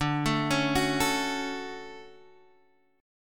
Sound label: D-Major 7th D down Guitar Half Step Down